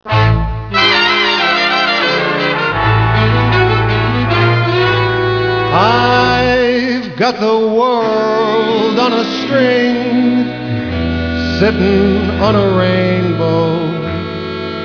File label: string; tribute